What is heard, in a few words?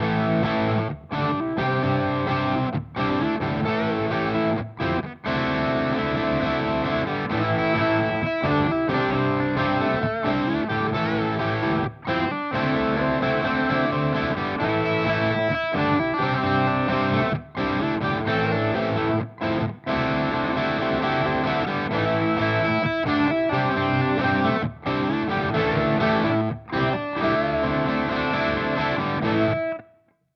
Space Charged V2 Gain Noon; Crazy Tube Circuits